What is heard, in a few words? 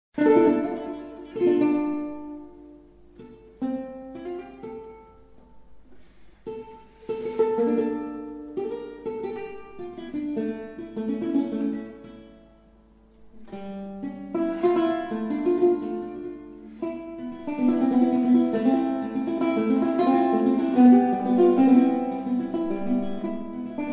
pedale, lutes